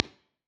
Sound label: mystery impulse